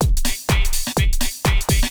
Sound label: DRUMLOOP HOUSE 125; eJay